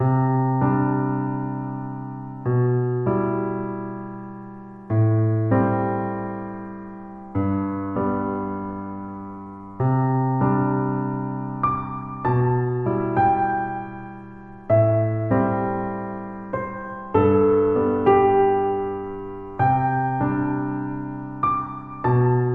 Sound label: piano; bgm